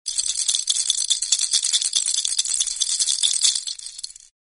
comedy baby rattler

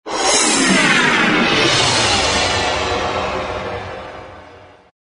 cymbal gliss

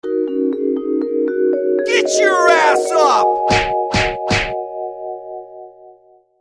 Wake Up Call

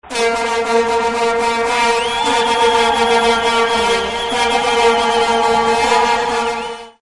Vuvuzela

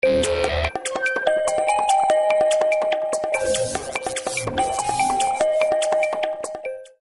8 bit bells ringer

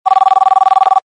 ring cell phone vintage

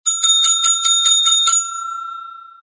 bell desk steady ring

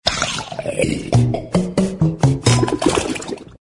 mud gurgle footsteps

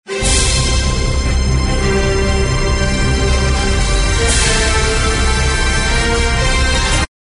grand adventure

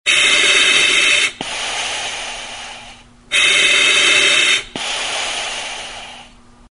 oxygen mask

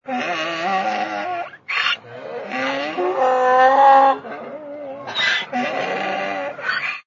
donkeys

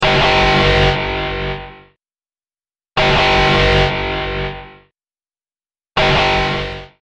Alarm Warning

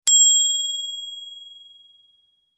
Bell Alert

